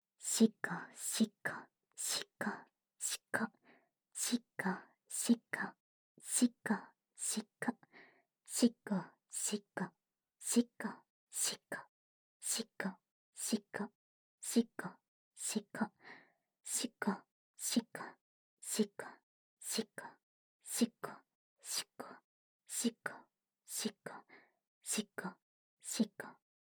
シコシコループ; ダウナー系仲居のゆまちゃんに温泉旅行中に色エロされちゃうバイノーラル

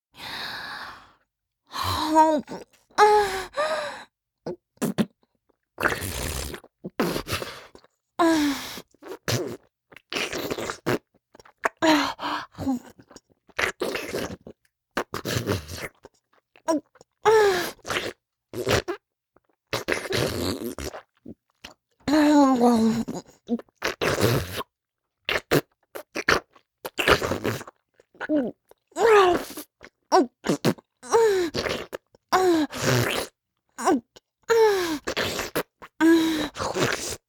フェラチオ(中)：頭を前後に振って陰茎をしゃぶる; 実用ボイス抜粋集

